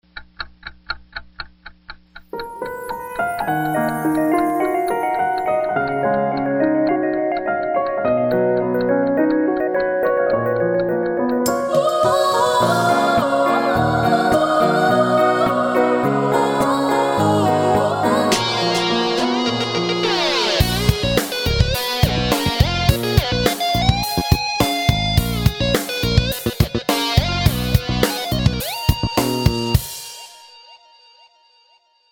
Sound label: Pop Rock